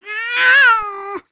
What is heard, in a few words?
cranky, Catz